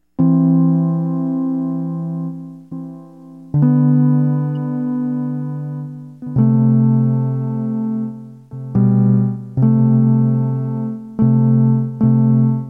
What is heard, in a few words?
cellos